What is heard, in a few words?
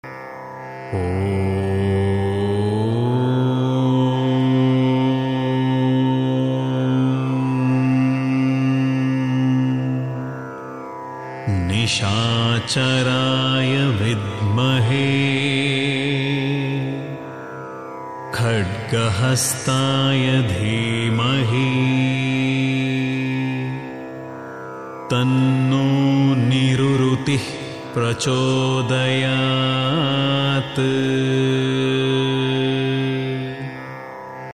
nerurute gayatri